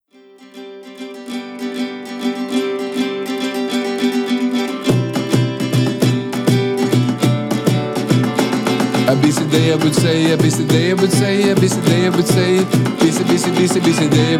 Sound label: Acoustic